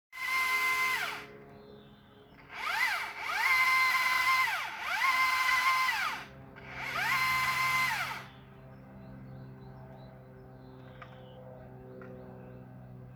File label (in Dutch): boomzaag; tuin